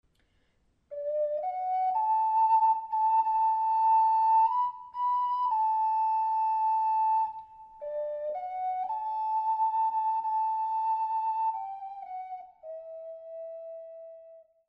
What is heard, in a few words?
Kumbaya, ocarina